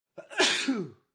Sneeze Male

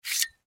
Curtain Rod Slide Close Fast Aluminum; Windows; Blastwave; Foley

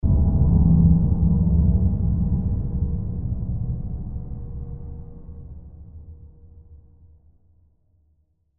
Horror Hit Deep Dark; Blastwave

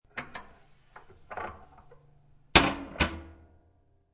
Fireplace Door Close

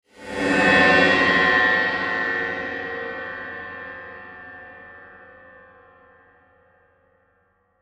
Whoosh Ring Metallic Delay; Blastwave

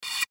Piccolo Blow Air, Piccolo Blow Air In Mouth Piece; Woodwind; Blastwave

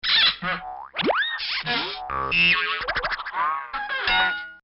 Comedy Effect Rapid Sequence; Funny Sounds

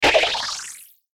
BUTTON ASCEND BURST; Multimedia; Buttons

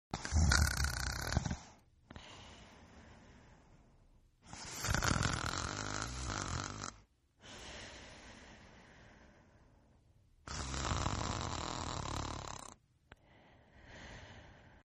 Snore; Snoring; Sound Effects:Human; Partners In Rhyme

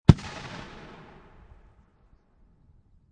M224 60mm Mortar Fire; VRSonic; Guns

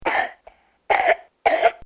Child Coughing

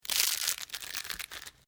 Dry Brittle Snapping Long; Various Organic Foley sounds

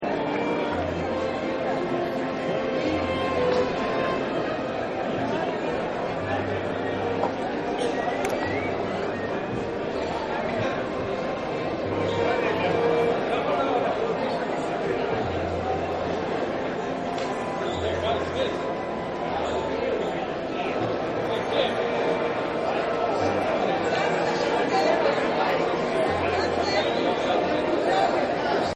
Medium Crowd Music; Crowds; Backgrounds; Partners In Rhyme

